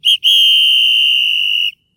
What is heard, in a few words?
shrill whistle